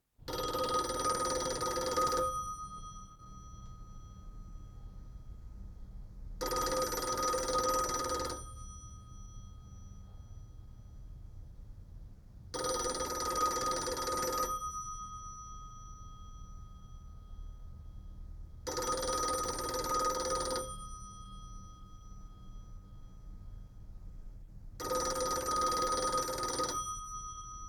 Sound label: phone ringing